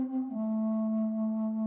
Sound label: Music